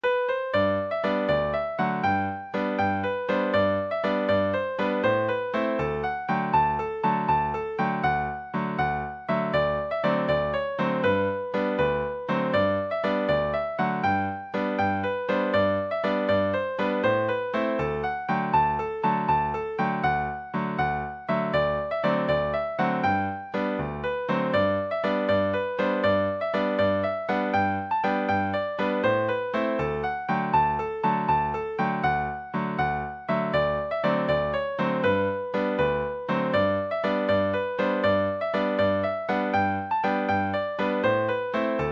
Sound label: french canadian four-step, session tune midis